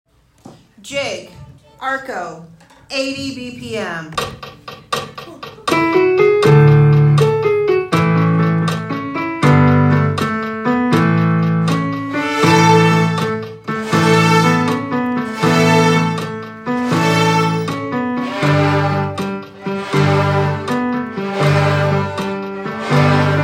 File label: jig 80